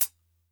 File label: TING LP